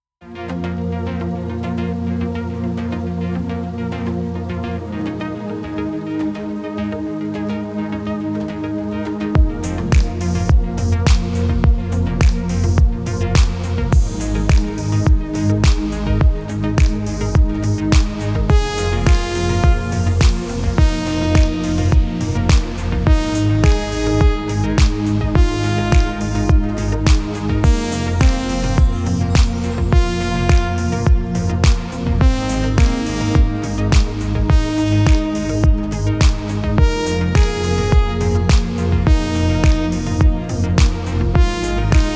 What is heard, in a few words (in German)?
Musik zum Joggen